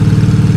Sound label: engine fast